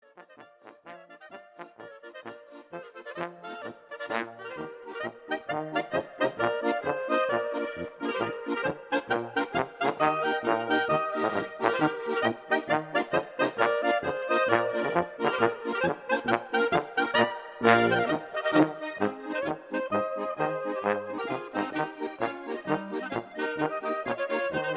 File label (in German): Polka